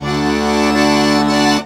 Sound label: ACCORD HITS; Best Service; World Colours